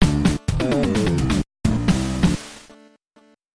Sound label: stage clear, nes